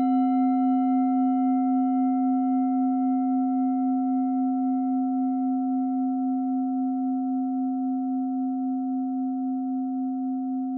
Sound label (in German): klangschale set; Durchmesser = 16,6cm; Höhe = 8,6cm